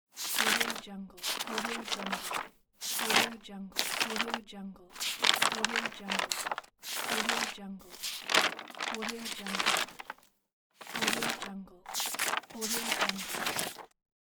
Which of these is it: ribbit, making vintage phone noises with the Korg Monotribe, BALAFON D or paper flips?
paper flips